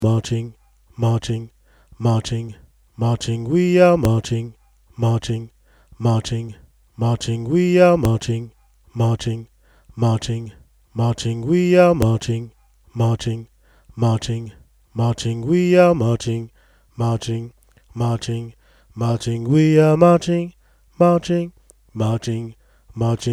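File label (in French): Ténor Syahamba, Gospel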